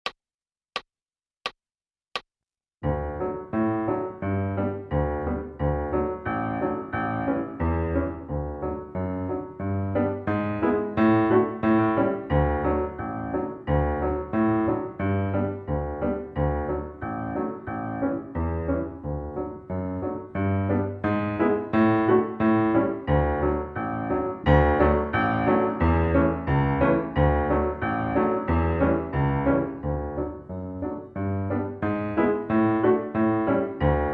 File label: Piano; Soldier's Joy